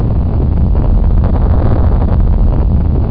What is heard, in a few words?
exhaust; super trench attack 2